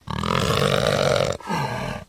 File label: pseudodog death; monsters